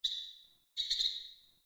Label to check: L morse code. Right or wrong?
Wrong